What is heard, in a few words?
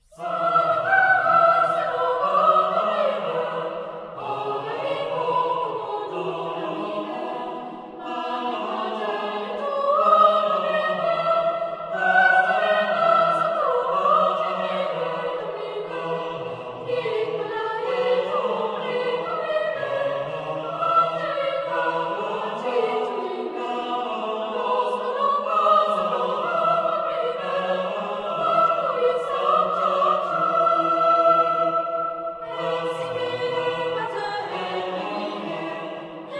Psallat Chorus; Sarband